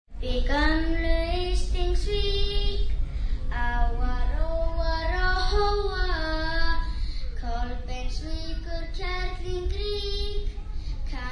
candidate in a singing contest among icelands youngsters; gruenrekorder